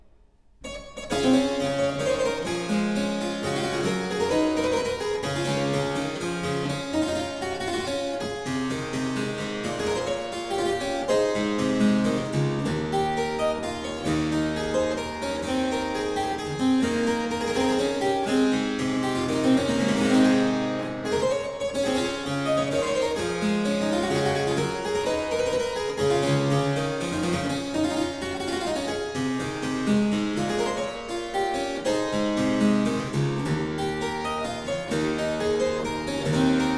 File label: Suite in D Major Courante, Harpsichord